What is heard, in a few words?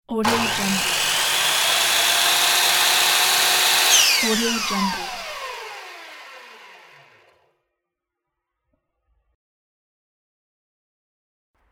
table saw